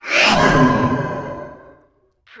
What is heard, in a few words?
Alien Breath; sound effects bible